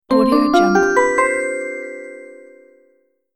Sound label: magic walk up